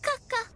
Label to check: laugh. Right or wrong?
Right